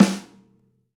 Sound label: Rock Snare, Roland BK-5; Snares